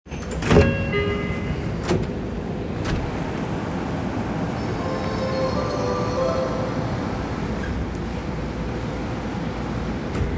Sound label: train sounds; 西武池袋線元加治東